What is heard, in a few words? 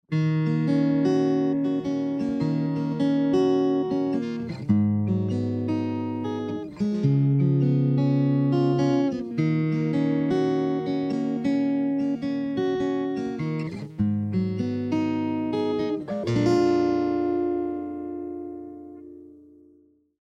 acc guitar; conversion to digital